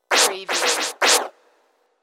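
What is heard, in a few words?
DJ Record Scratch Effect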